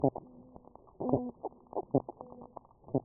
Ariopsis felis, soniferous fish; Florida

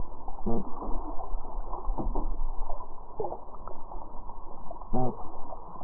honk type b, fish

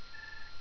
echo high pitched; highpitched noise

